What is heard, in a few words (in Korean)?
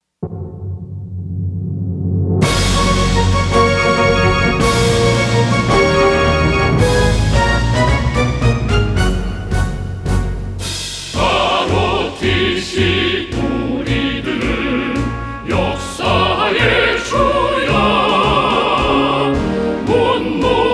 ROTC찬가 혼성합창 chorus